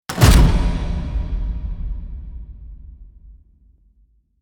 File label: Stadium spotlight hit arena entrance